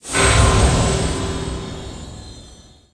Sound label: LEVEL PROCEED